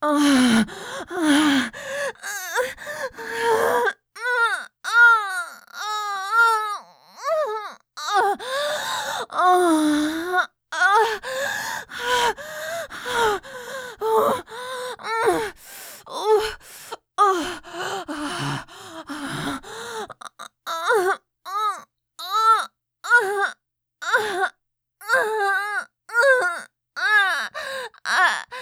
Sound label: intense